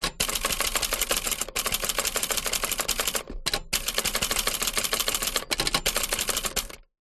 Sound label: office typewriter typing fast rear close vintage 1960; lemair helvetia